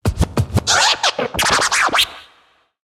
Record Scratch

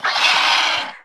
Nesting Roc Attack

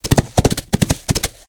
Hooves Attack

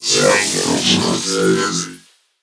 Replicating Menace Death

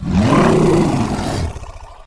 Attack; Ironhide Runt

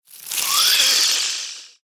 Glowfly Death

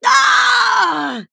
Female Troll Death

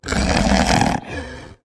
Faerie Dragon Enter Play

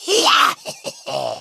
Male Weasel Attack

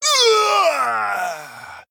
male troll, Death

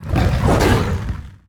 Druid Of The Flame Attack

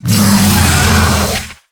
Attack

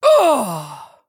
Draenei Death

